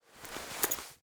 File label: weapon holster